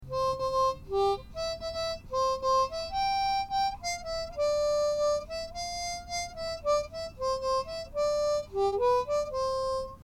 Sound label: harmonica; clementine